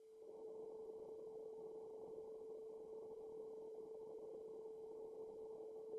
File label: sine 440Hz